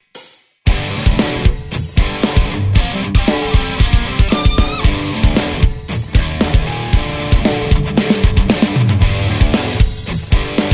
amt electronics ss20

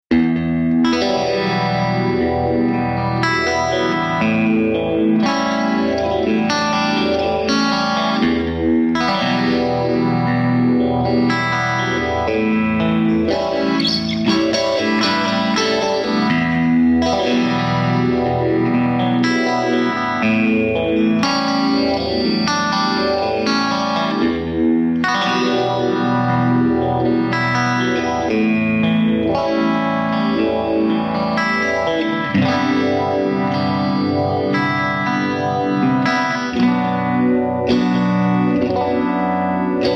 retrosonic phaser

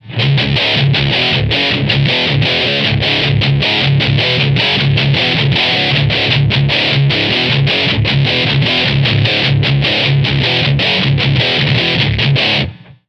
demonizer heavy; Damage Control

